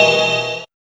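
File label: synth hit; E-Lab; X Static Goldmine 1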